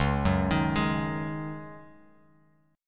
Db-Suspended 2nd Suspended 4th-Db-down-Bass Standard; arpeggiated; JGuitar